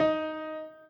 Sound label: piano intro